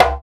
African Perc

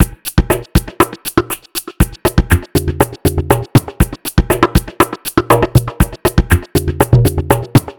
Ethnic Drum Loop

